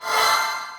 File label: sound cast spell